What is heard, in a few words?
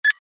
Login confirm beep